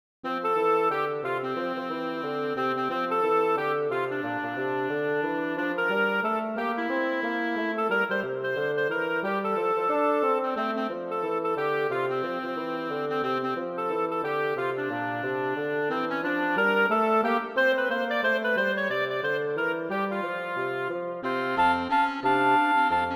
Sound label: Jazz Xmas Jingle Bells; Woodwind Quartet; Pierpont, J.